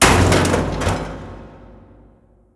metal debris; Left 4 Dead 2

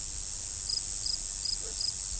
grashopper; ambient; AssaultCube; Alien Stars; American Muscle Series

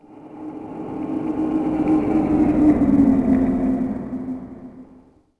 City Flyby Med; Ambient; Sid Meier's Civilization 4 Complete; Beyond the Sword

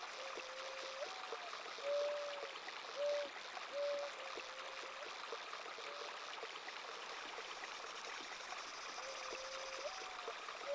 water owl; ambience; AssaultCube

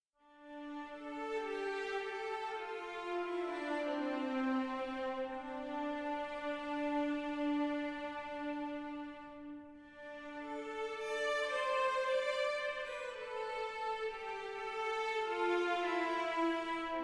travel neutral; Mount&Blade Warband

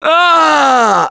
Death Scream, Survivor Voice; Gambler; russian

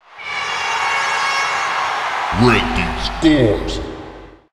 cheer Red Team; BaboViolent 2

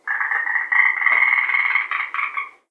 frog long; Left 4 Dead 2

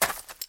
footstep; Ace of Spades

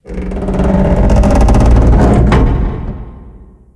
fire groan; Left 4 Dead 2

